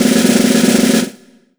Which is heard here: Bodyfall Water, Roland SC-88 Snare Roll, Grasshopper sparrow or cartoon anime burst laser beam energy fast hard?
Roland SC-88 Snare Roll